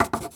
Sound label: Photo Crayon Env Scatter